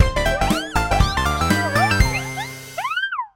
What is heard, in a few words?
tada; Boulder Attack 2